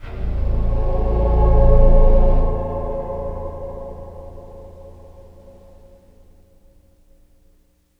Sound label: IMPACT SCARY, Cinematic Impact; M-Audio Pro Sessions; Liquid Cinema